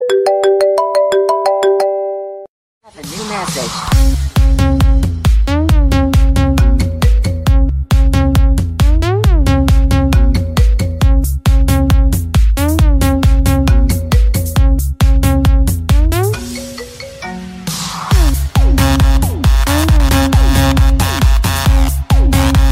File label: Ring; Apple